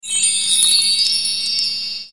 kill prop; css; devil-hunter-multigaming

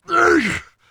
pain severe; garrosh

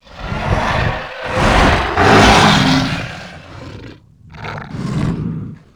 attack, alpine predator, creatures; slender; tera creatures

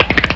crack bones

